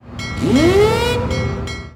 move order fleet; Hearts of Iron IV Death or Dishonor

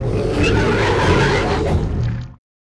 Entity Strike; Sid Meier's Civilization 4 Complete; Beyond the Sword; Units

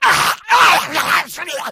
become enraged, infected

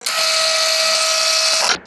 sound servomotor, landing leg; KSP

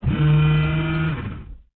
Mech Motor Heal; Sid Meier's Civilization 4 Complete; Beyond the Sword; Units

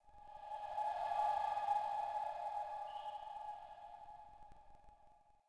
Choir Pad; Sid Meier's Civilization 4 Complete; Beyond the Sword

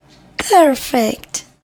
perfect; Frets on Fire

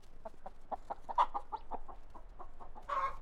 chicken; Mount&Blade Warband

